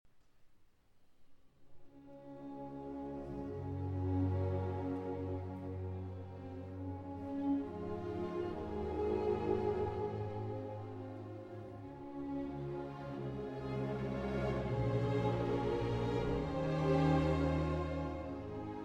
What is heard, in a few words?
Piano Concerto in A minor, Adagio; Op. 16; Grieg